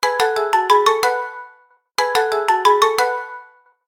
Marimba, ringtone; Coolpad; Note-8

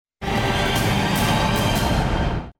Sharp; Oppo; T703

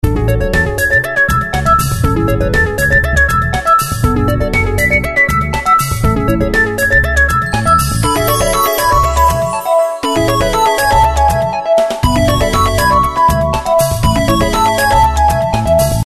Melody, Ring; Doro Liberto 820 Mini

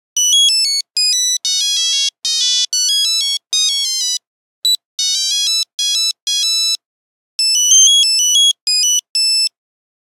Nokia 8310 Entertainer, Nokia Buzzer; Windows Phone apps

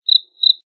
Cricket, notifications; Infinix; XOS 12

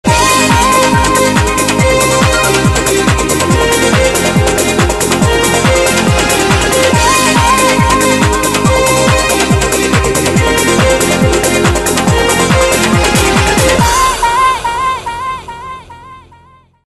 hyperactive; Motorola; SLVR-L7; i-mode

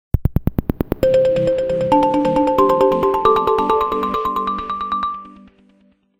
Happy Chord; Nokia; Nokia Tones; Windows Phone apps

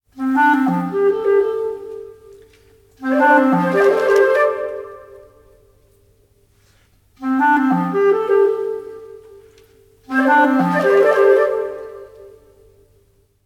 Bird Box, ringtones; Nokia

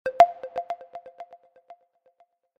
Delay, notifications; Infinix; XOS 10

